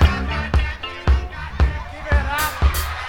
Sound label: kick loop